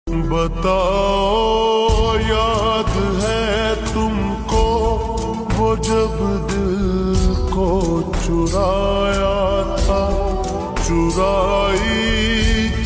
Lofi, ringtone

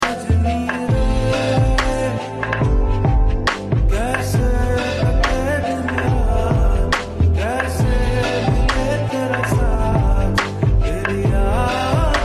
Lofi Mix

